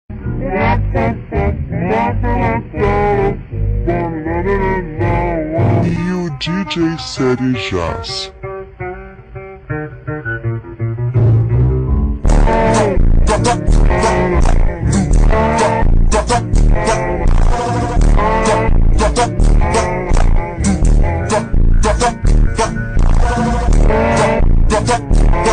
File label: funk ultra slowed